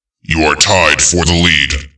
Tied Lead

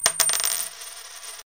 coin

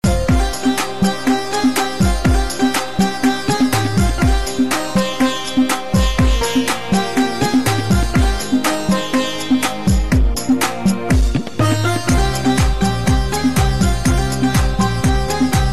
Sitar

